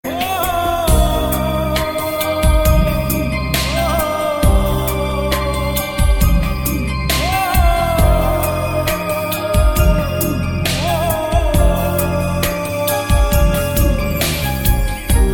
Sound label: Hoo